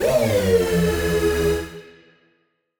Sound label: Siren Horn down, Ramp Down, E; SirHornA; future rave